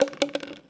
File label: Roll Plastic Soda Cup; SFX Kit; Foley - Misc